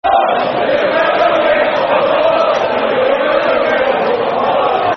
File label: Win Fuck All, Football Chants; Reading; Reading Rfc